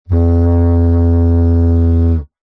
foley blowing into plastic pipe vibrate resonated didgeridoo like